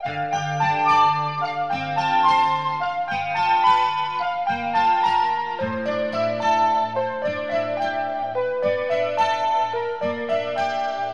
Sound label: keys and guitar; Loops